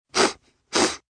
Sniffle Double, Sniffle, male, short x 2, cold, sick, (human voice); Sniffling